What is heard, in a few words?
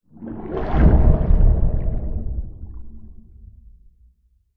impact splash underwater